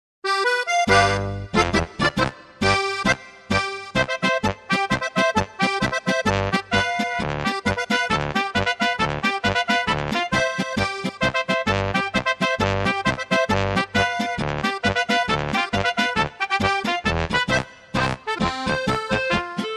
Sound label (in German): Trompetenecho